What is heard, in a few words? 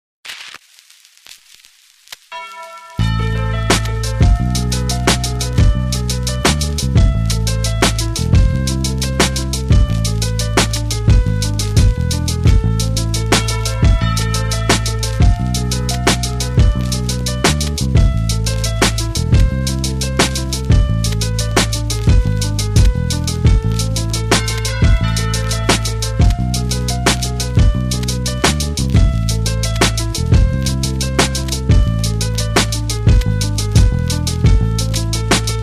Instrumental